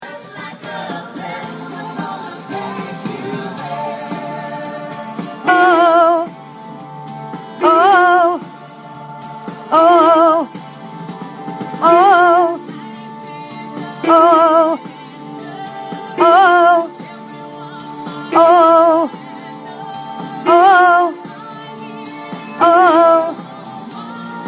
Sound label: Oooh Lead